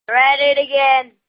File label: kid fight